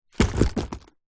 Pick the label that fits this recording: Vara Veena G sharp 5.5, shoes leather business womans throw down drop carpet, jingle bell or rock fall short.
shoes leather business womans throw down drop carpet